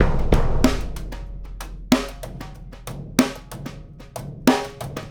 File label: Big Drum and Snare